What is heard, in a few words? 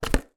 dustbuster handheld vacuum cleaner remove from charging dock base